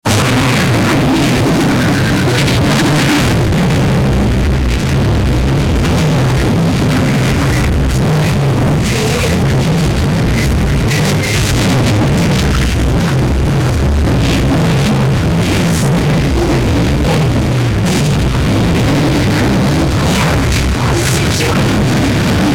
persecution chase terror

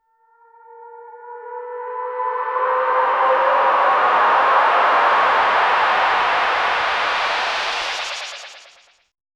viral risers

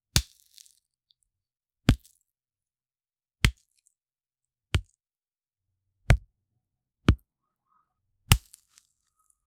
firm wet crunch as the, apple

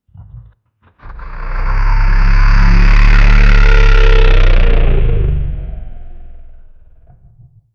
monstar running in field

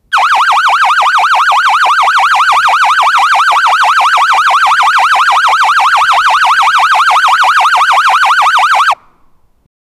hand crank air raid siren; apocalyptic

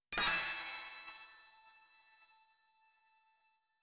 magic sparkling projectil

